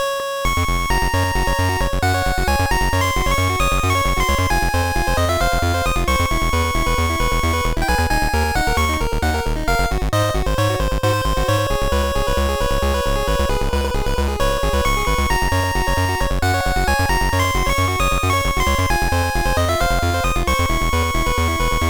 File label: Bon Voyage ~Chiptune Version~; one piece going baseball kaizoku yakyuu